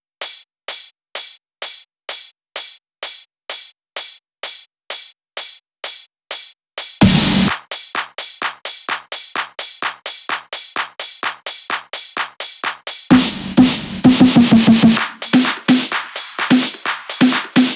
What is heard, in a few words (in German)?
Drum Loop 128BPM FULL ohne BD